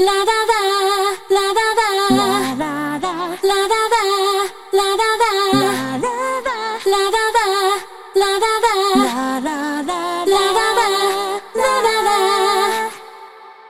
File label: vocal